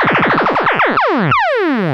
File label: Zapps, sci-fi